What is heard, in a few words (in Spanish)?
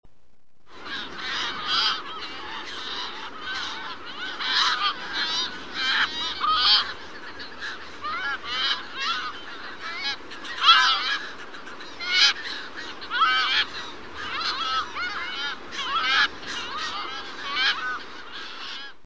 gaviota de audouin